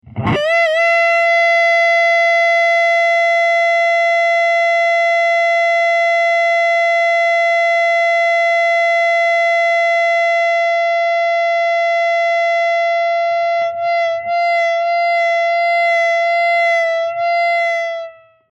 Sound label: sustain, guitar; monza